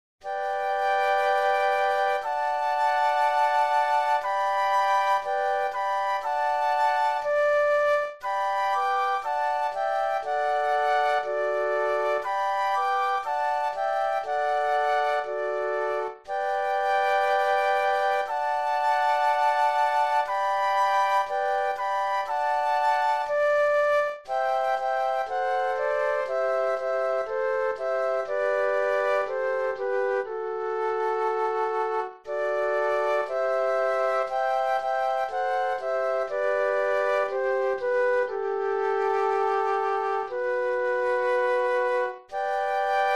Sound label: Flute Trio; classical